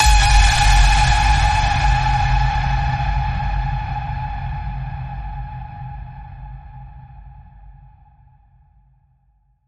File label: The Final Ping, G sharp